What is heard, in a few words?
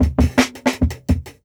Jungle Breaks